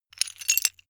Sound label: metal pieces clatter short